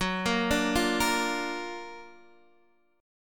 F sharp Augmented Major 7th, Guitar Half Step Down